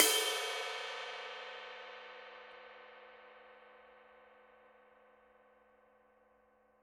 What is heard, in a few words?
PAISTE TWENTY MASTER DARK CRASH RIDE